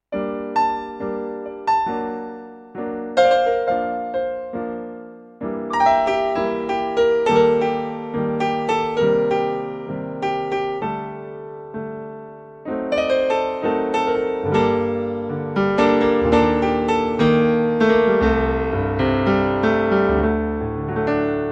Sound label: Grand Piano